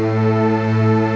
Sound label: STR CHOIR; M8; CMI Factory